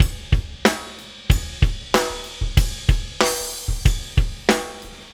Metal, Rock Drums Loops; ACID MUSIC

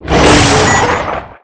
POWER UP; planecr

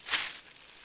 grass; ambient; Shadowman

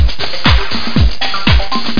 background; Virus-Attack; Amiga

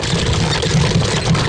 Car; SERennen

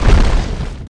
footst

